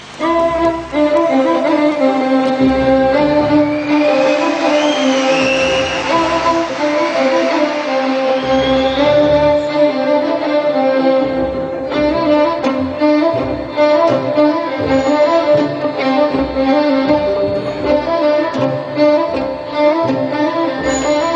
Song In 3 Notes

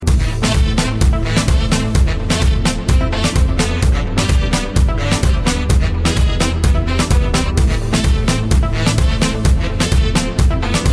Electo Guitar

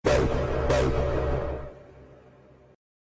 Dance Tone Sms

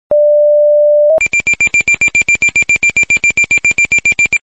Fire Pager; MidCo

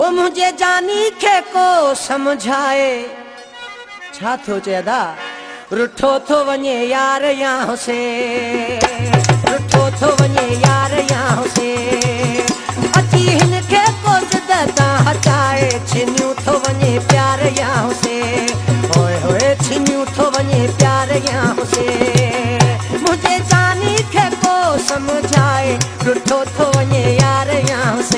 Sindhi Sad Song

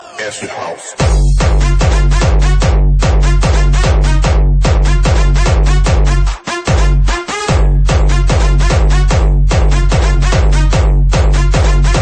Acid House

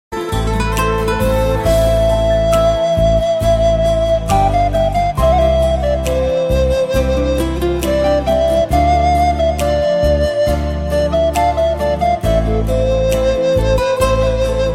Romantic

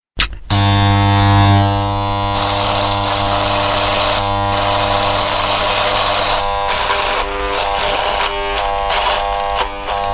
Anoying Razor Sound

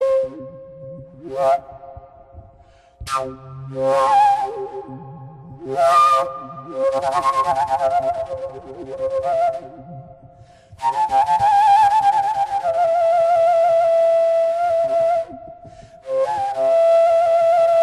fujara

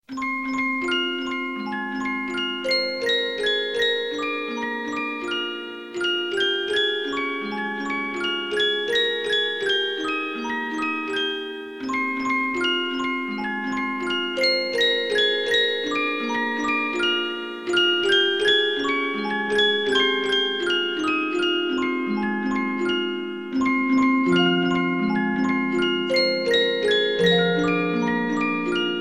Music Box; Tapions

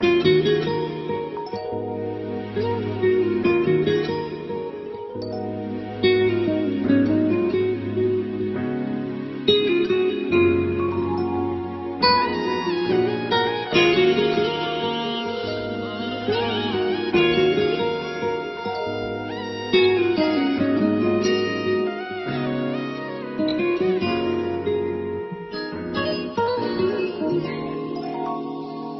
Classical

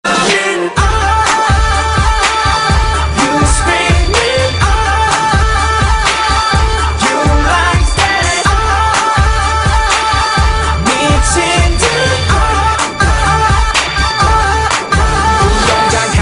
Run

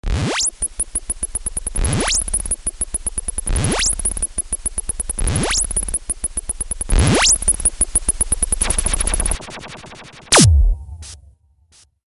Funny Sound Effect

